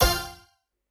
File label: scatter short; spinsane